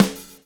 groove; AMG Gota Yashiki Groove Activator

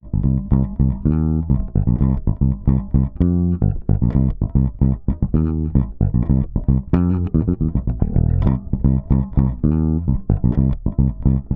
112 C DISCO BASS; 112 BPM DISCO TWO C

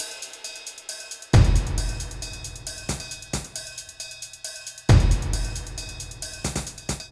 Beat Full Perc & Toms, 135bpm; Zero-G Sound Sense Grime Scene; GS Construction Kits; A Positive Vibe; 90 s Sample CDs

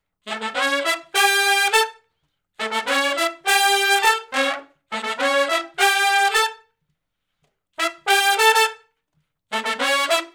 R&B Riff (A) unison, Horncraft

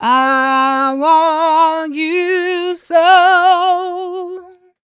f 126 mel f-sharp, fem Vocals

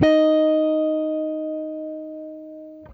JAZ OCT SUS, guitar; Quantum Leap - Guitar & Bass

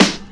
KIT, Dance Mega Drums, HIP; Best Service

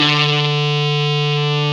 GTR FUZZ; Club-50 - Foundations Roland; Dirty; Stack

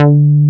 JNO TEK D4, TEK BASS; Drums In Your FACE!

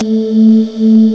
CHOIR, HUMANS; M8; CMI Factory

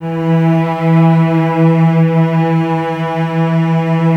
String Ensembles Cellos E 3; Miroslav Vitous; 90 s Sample CDs

